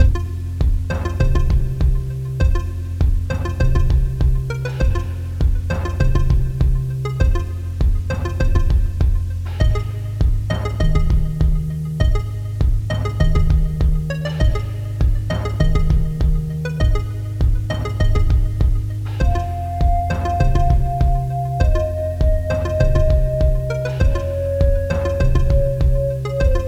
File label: BGM Title; hyperspace-roll